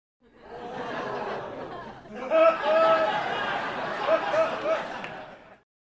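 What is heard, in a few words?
Crowd 100 Laugh Reaction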